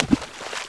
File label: water large splash